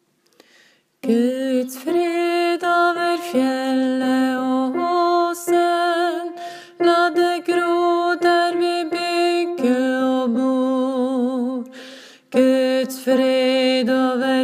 bass; åfjord

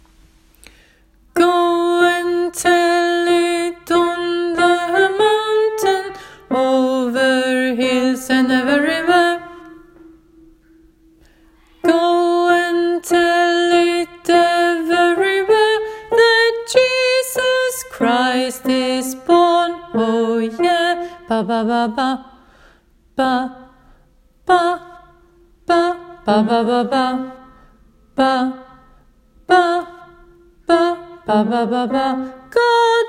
bass; åfjord

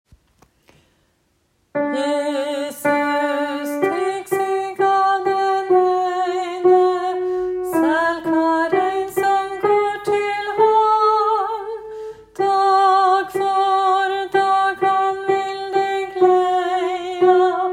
bass; jesus tek seg av den eine; åfjord

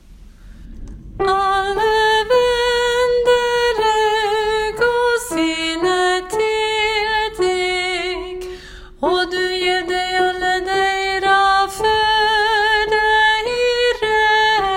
sopran; alle-vender-augo; åfjord

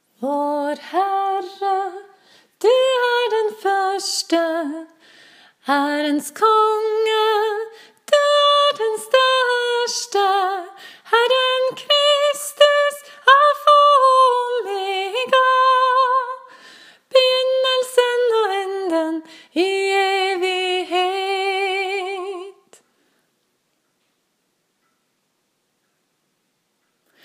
sopran; alfa-og-omega; åfjord

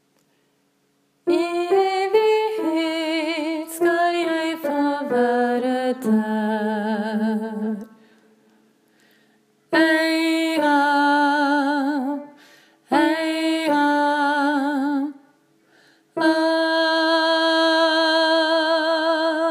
sopran; o-jesus-du-som-fyller; åfjord

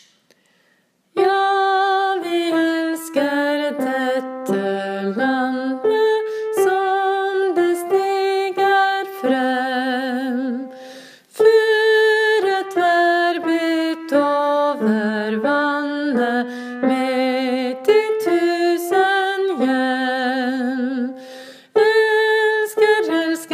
bass; ja-vi-elsker; åfjord

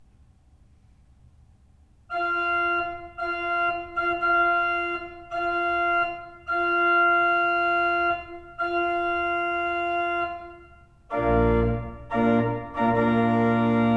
bryllupsmarsj, kirke; r.wagner; lohengrin; buvik kirke; skaun; brudemarsjer

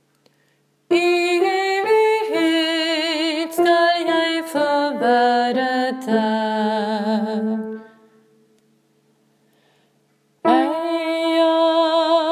bass; o-jesus-du-som-fyller; åfjord

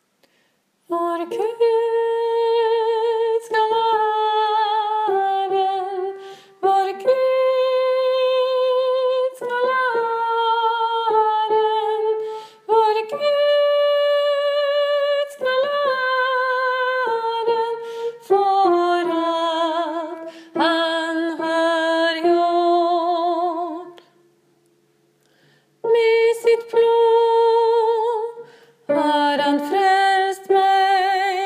bass; var-gud-skal-ha-aren; åfjord

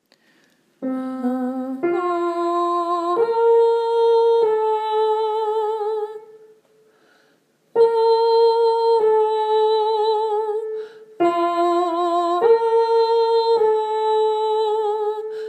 brenn du betlehem sopran; åfjord

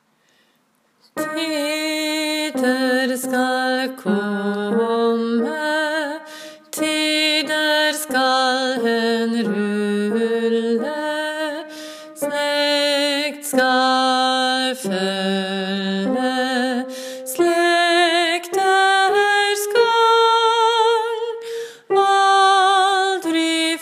deilig er jorden bass; åfjord

